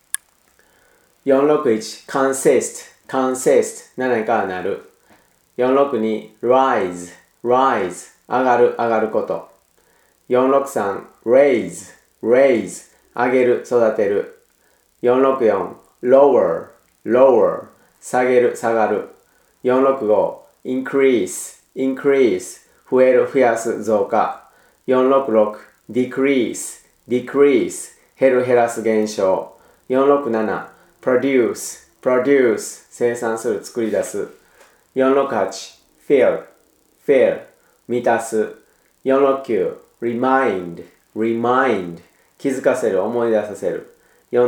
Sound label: tango